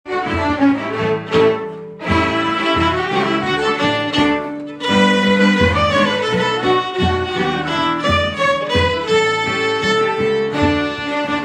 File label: polka